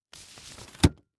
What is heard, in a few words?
Umbrella Open, Household